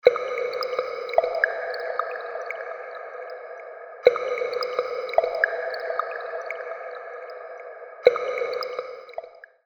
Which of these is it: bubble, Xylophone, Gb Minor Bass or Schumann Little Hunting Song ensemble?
bubble